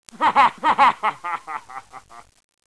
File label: laughter